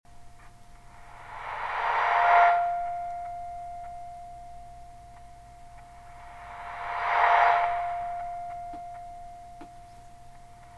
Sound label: distorto feedback spikes